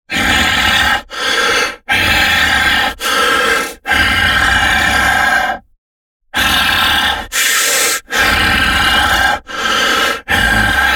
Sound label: kitty sfx